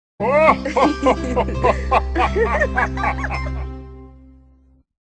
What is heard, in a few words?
king laughs; the king; loz